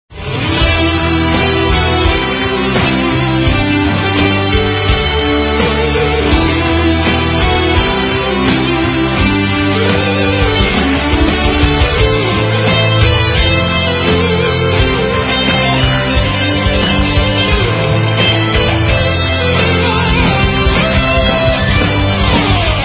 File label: Instrumental